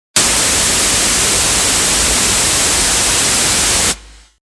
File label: Noise